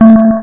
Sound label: Pong Wall; Mortal Pongbat 1.4.1 68K